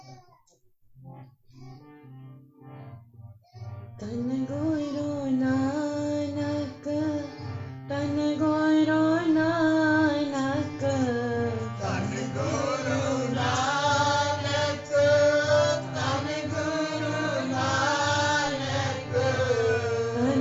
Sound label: Kirtan; Weekly Sangat Online Kirtan